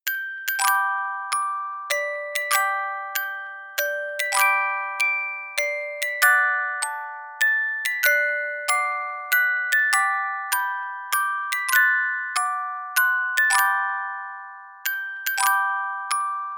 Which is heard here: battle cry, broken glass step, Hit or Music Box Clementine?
Music Box Clementine